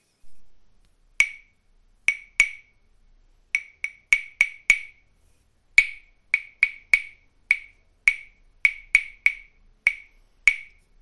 claves

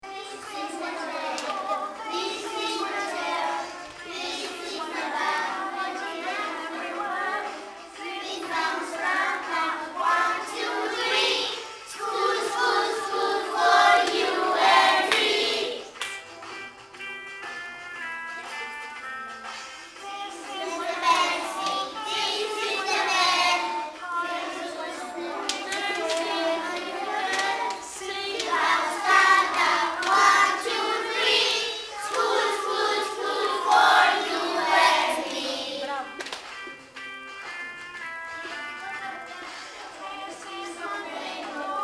School song

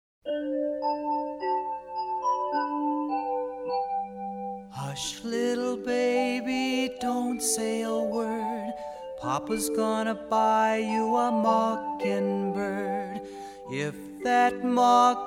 Hush Little Baby song

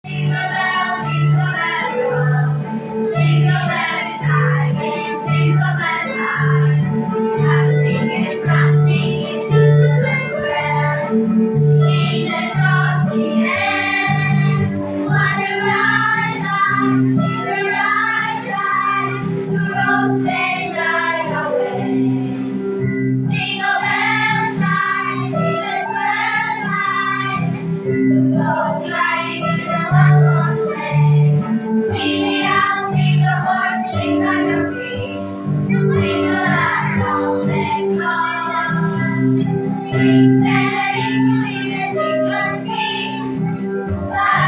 music audio

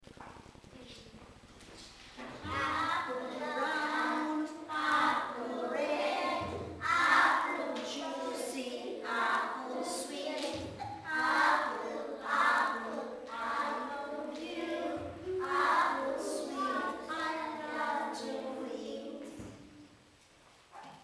APPLE SONG

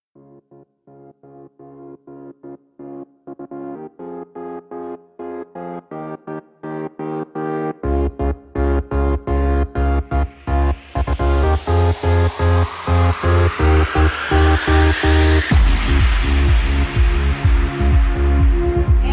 Dance electro

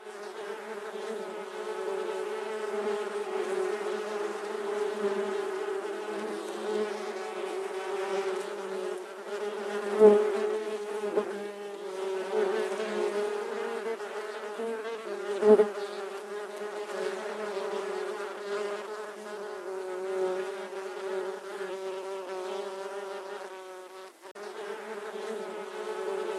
Bee Sound Effect

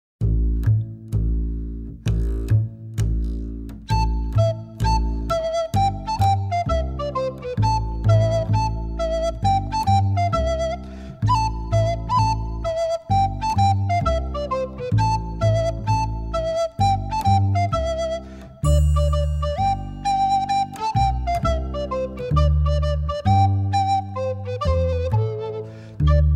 Tarantella

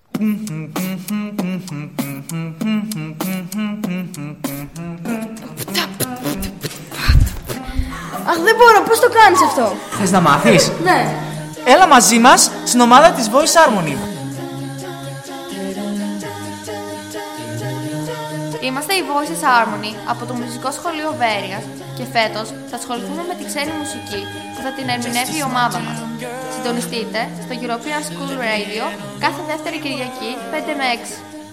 spot 2 voices harmony

